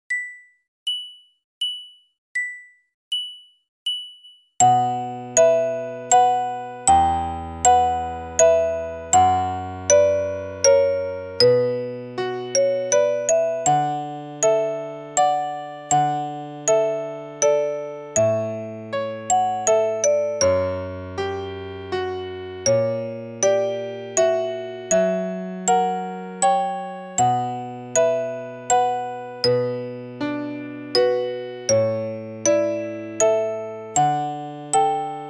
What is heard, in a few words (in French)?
valse en si m lente